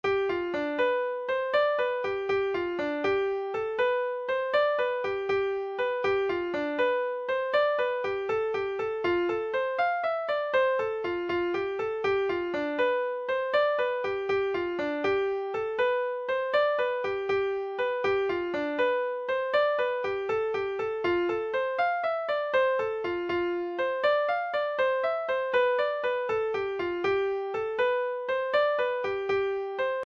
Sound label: twopenny jig; irish; guitar tab